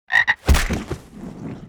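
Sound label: war attack, Mob; DEMOLIS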